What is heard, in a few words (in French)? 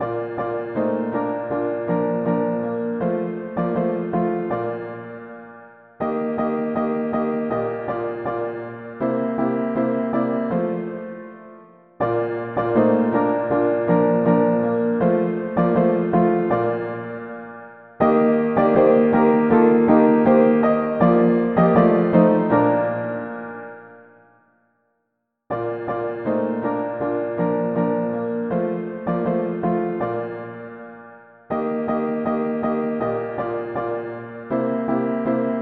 Fidele au conseil divin mono piano